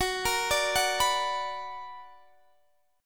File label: B Minor Major 7th